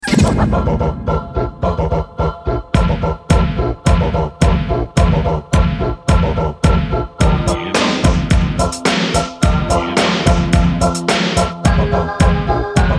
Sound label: Beatbox